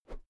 whoosh thick slow soft; swooshes-swishes

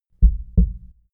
heart beat double hard; comedy-cartoon; koerpergeraeusche

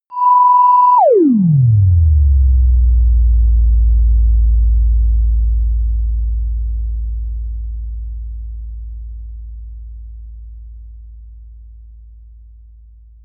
protective shield shut down; energie

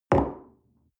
coffee place table soft short, restaurant cafe

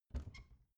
bathroom cabinet door creak open soft

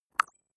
click synthetic squeak soft, signale

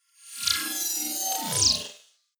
swell short metal string computer; sweeps-swells

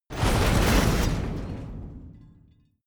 metal long pieces, industrie wirtschaft metall

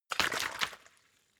bottle water shake short; berufe

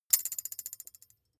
thin metal boing vibrate chink; industrie wirtschaft; metall

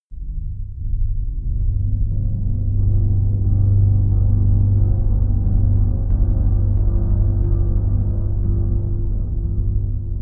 bassy pulse, Pads

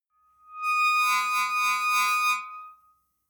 metal squeal short high tonal; industrie-wirtschaft

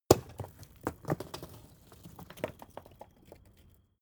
stone large fall; natur-tiere; steine

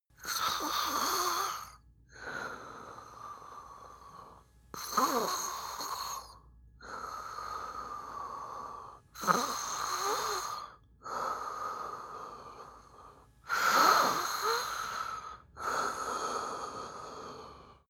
male sleep breathe mouth slow; koerpergeraeusche; menschen; soundpool

